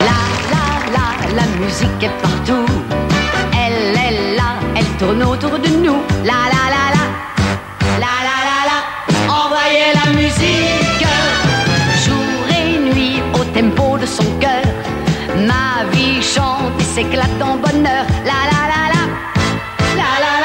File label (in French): sonnerie